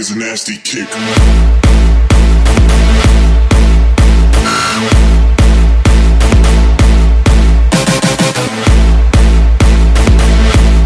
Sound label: Nasty Kick